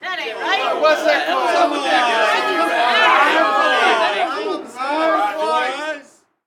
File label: street ball crowd boo; SFX Kit; Crowds